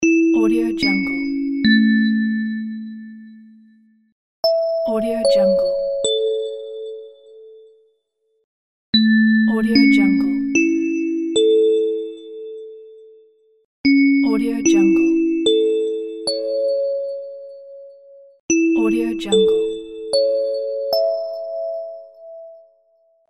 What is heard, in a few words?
airport announcement ding; noobtorio